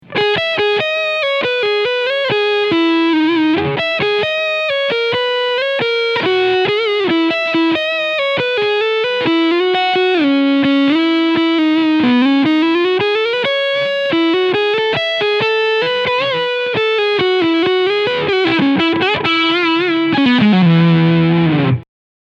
Schecter C 1 EA Classic SVPB Leadsound Front Humbucker

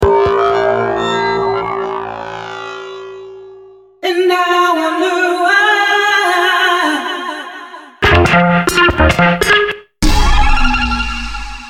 Klangbeispiele FX1

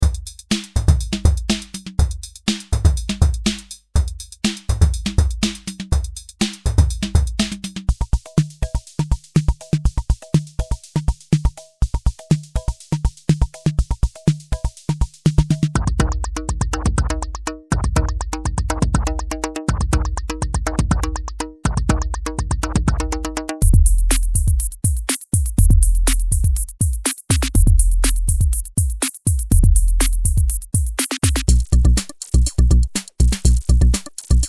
ND2 ein paar Drumkits

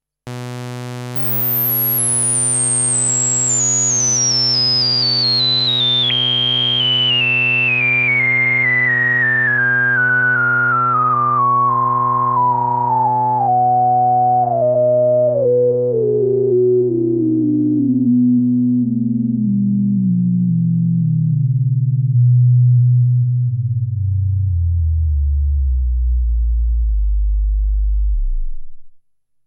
DSIP12M komische Resonanz

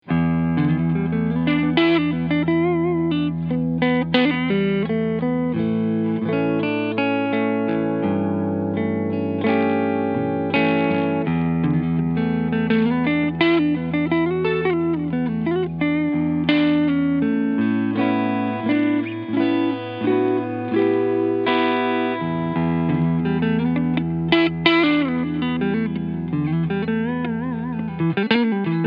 Gibson LP Special Tribute P-90 E-Gitarre Front PU Clean

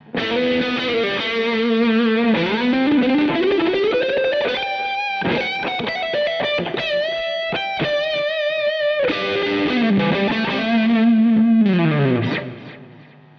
Amber Overdrive Drive und Tone 3 Uhr

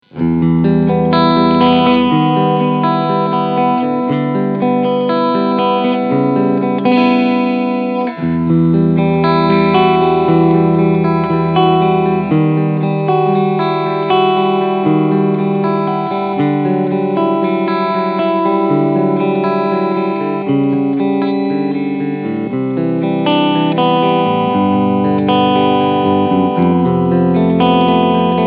Overdrive Cleansound beide Humbucker; Dean Guitars Angel of Deth

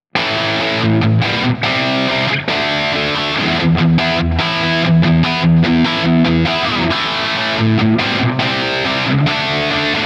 Gitarren Plug-ins im Vergleich Bias FX 2 Highgain Rhythm

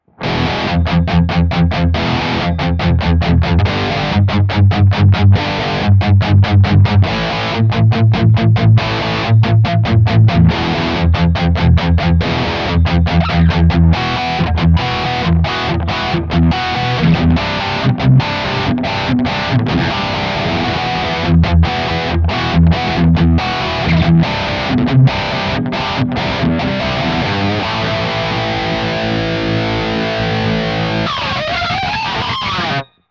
Soundvergleich Highgain Cort X700

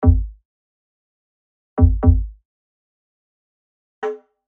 Plastik Rohr

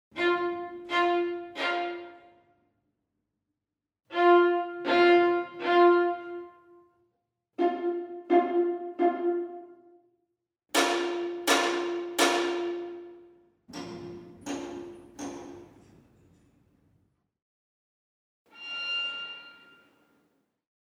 Strings Violine Artikulationen; Sacconi

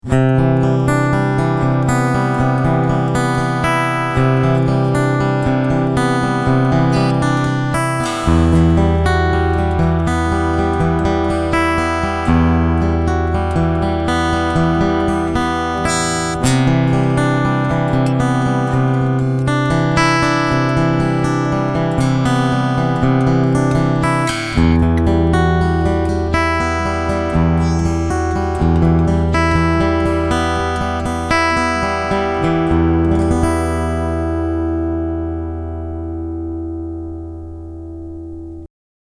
Picking Mit CT

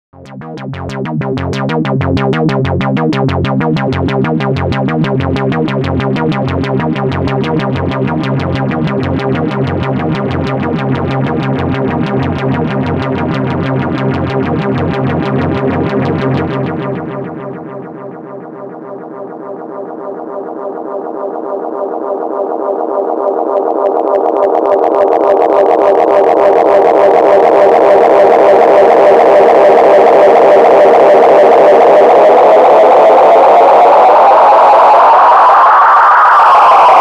Bassline mit Endless Echo

